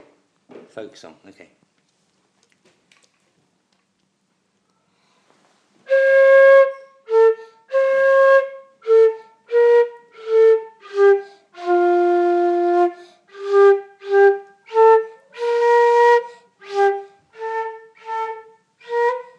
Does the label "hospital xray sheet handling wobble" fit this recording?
No